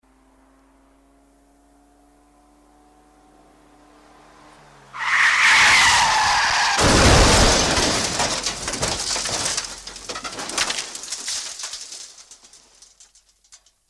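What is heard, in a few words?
variant of a long approach and crash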